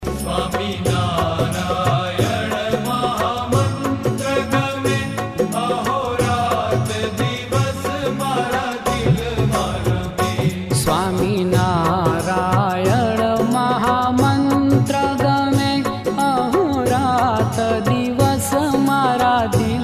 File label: swaminarayan mahamantra